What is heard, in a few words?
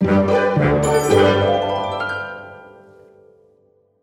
win game level